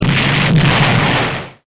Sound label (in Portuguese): explosao